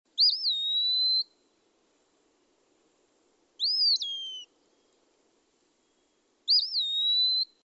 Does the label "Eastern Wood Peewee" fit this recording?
Yes